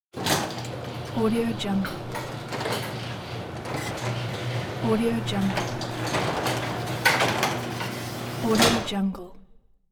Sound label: garage door